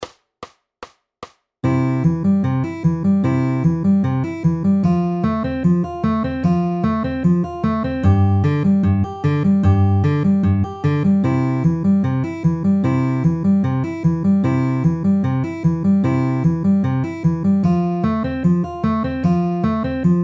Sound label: C F G C pinch ring ring at 150 bpm